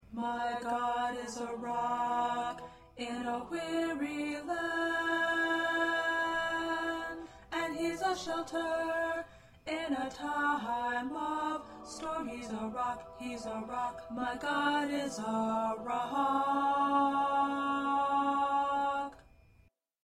1st Tenor

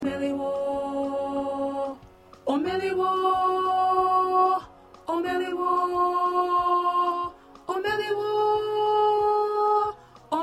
Alto, choir

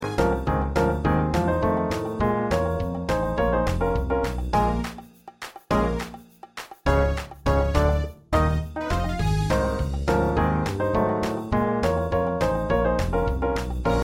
Accompaniment

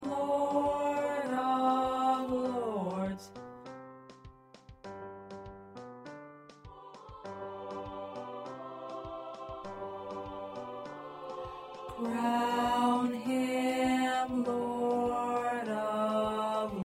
2nd Baritone

